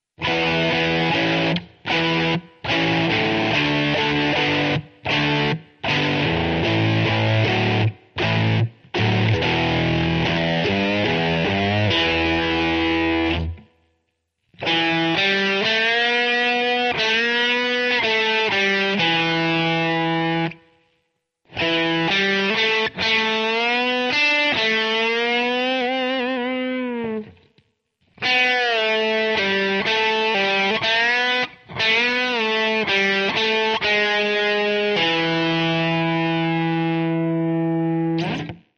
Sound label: Rock guitar; yao gun ji ta